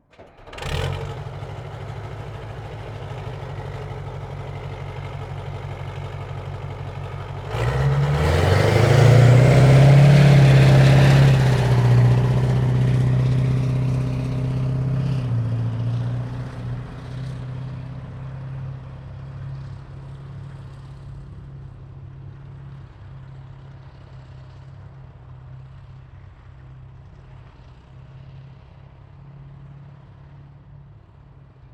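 exterior Start and Away Fast Semi-Distant, Warrior IFV; Stereo; Semi-Distant POV; Hammer & Spark Audio Production; Warrior IFV Custom Vehicle Recording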